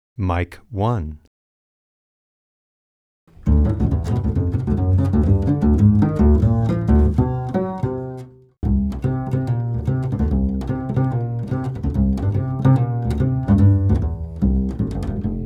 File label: Bass, Acoustic